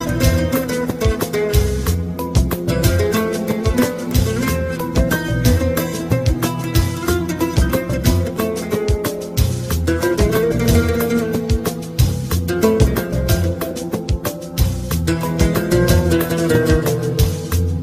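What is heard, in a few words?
türk remix ringtone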